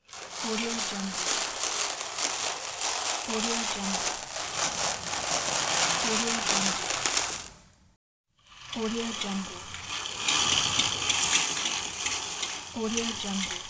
curtain

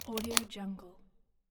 small lockbox clamp; stormwaveaudio

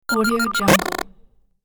tone sensor to glitch

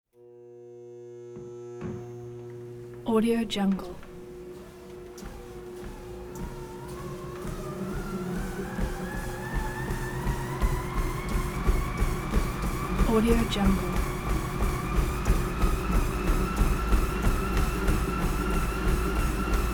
treadmill motorized exercise machine start

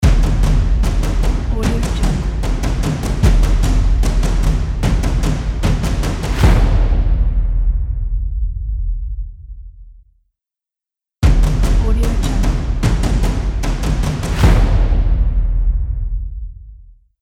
logo cinematic epic percussion trailer

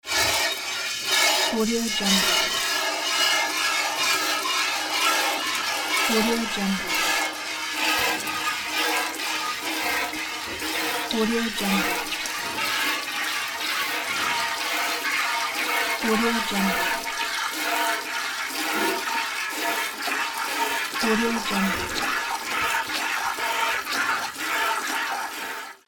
milking cow; n-audio

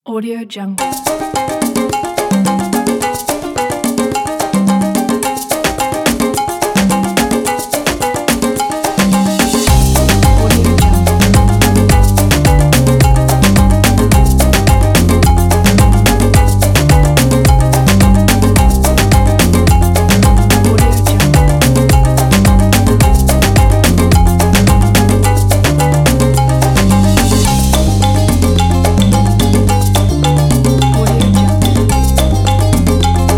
salsa

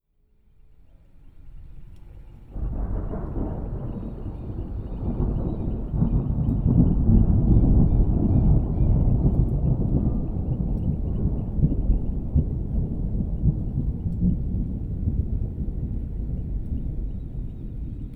thunder distant deep rumble

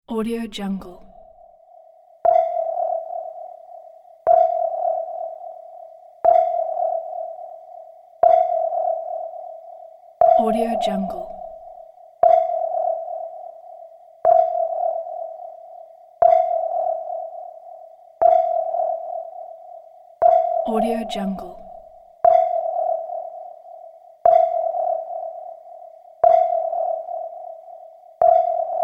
submarine

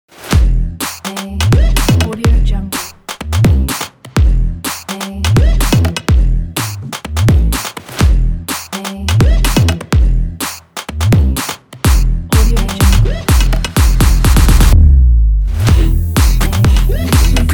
sport action beat kit

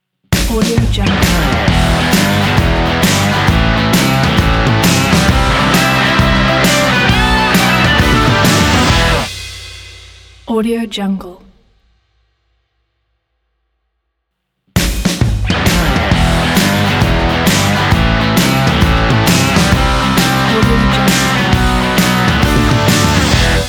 blues rock logo

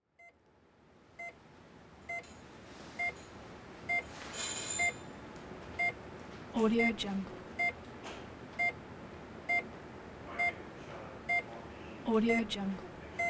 hospital room ambience quiet

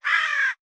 bird crow

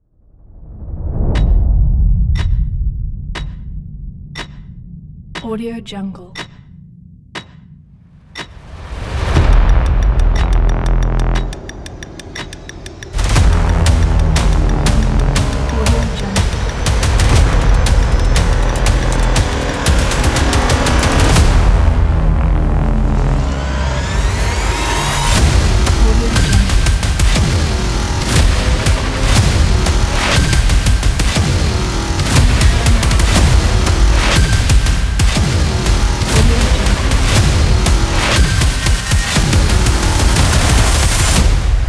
trailer kill; synthezx

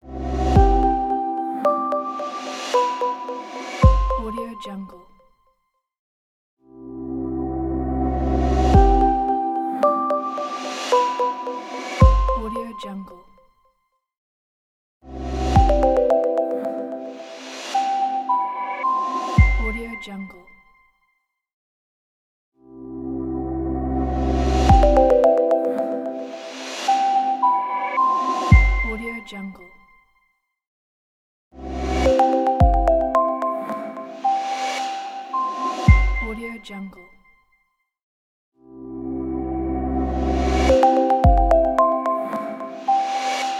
bright logo pack

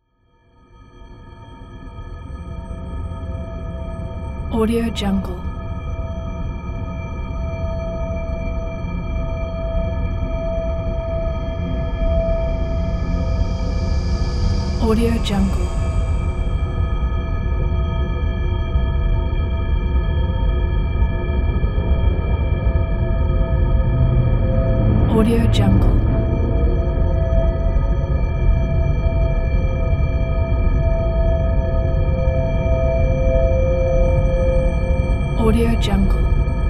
deep space ambient

